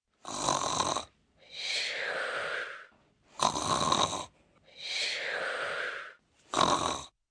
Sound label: Snore Multiple, Snoring, 1 child, several, snorting, human voice, kid; Breathing